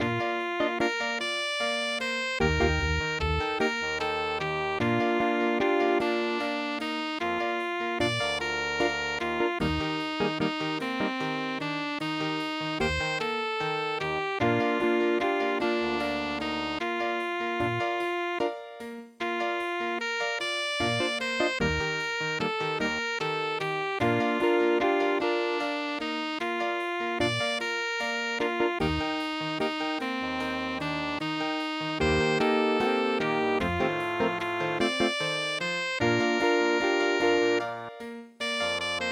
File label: jazz waltz